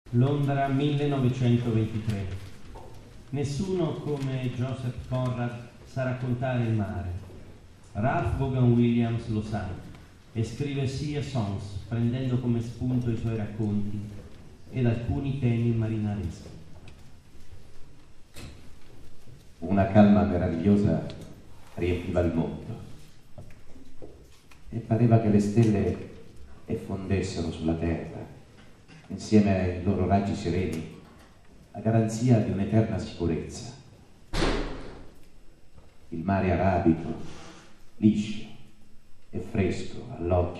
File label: intro sea song